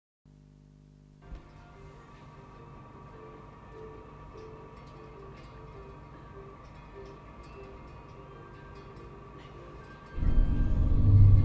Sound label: scanner surface noise (aquarium)